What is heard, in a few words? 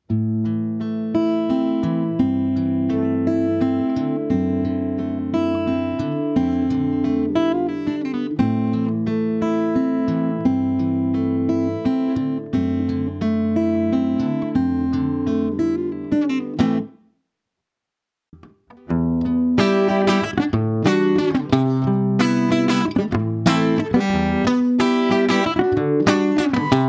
Martin O 18; James Tyler Variax